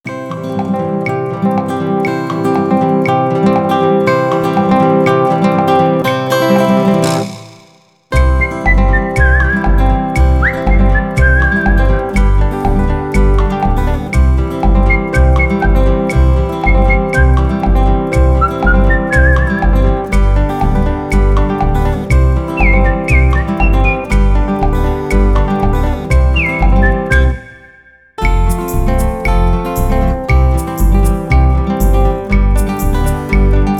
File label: Instrumental